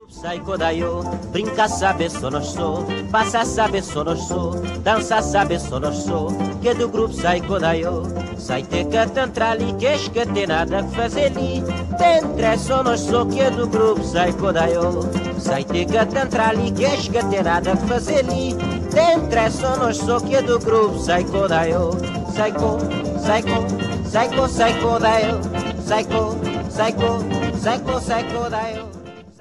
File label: ritmos caboverdianos